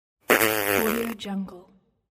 fart

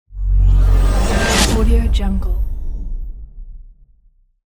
lightspeed; infinity-sfx-productions

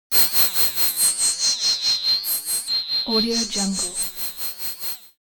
high wheezy vibration; game fx; smartsoundfx

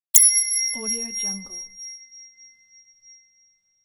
ping

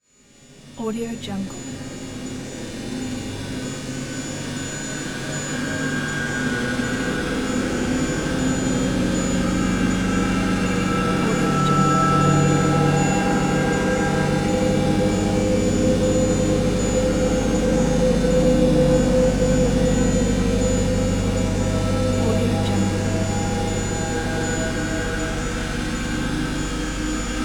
texture sci fi

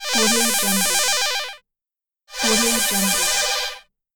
retro ringing sound

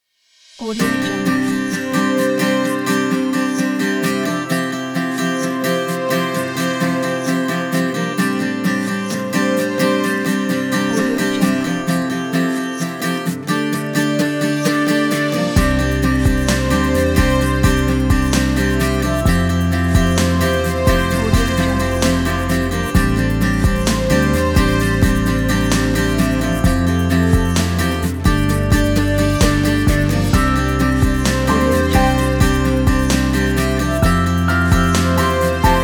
inspiration acoustic

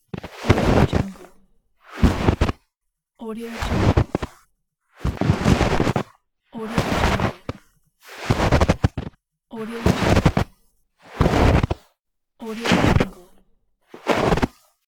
footsteps on deep snow

